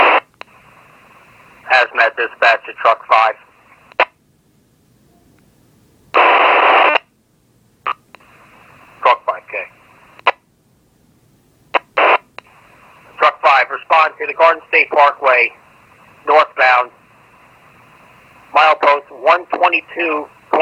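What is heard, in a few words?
AEGIS Decoded, radio sounds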